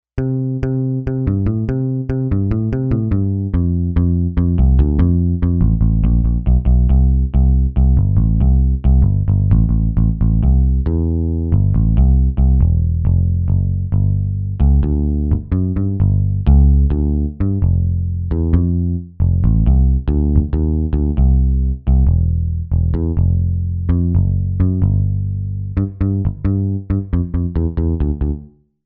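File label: Bass 60s PICK, synth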